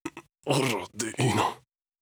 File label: VO in Pain; Aragami